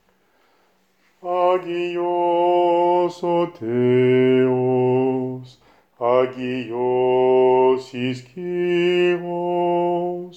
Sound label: hagios basse